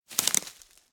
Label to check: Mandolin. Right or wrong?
Wrong